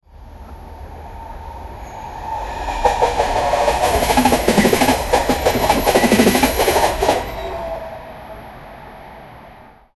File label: old train in a japanese, village